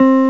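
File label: jazz guitar; gmplay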